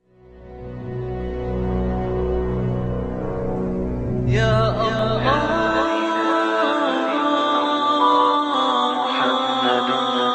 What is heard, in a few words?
Vocals Only, nasheed